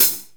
clean open high hat sound g sharp key, Royality free open hat tuned to the G# note.; open hat samples